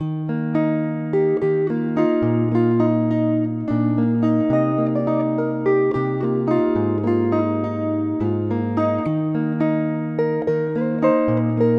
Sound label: Journey Guitar Loops, 106 BPM D# Maj; Oceans Apart; Cymatics